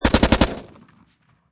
Machine Gun Sig Sg 552 Commando Long Burst Close Perspective